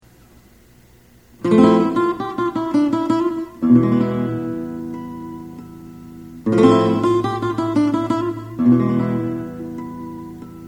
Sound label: spanish melody